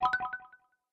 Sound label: calls confirmation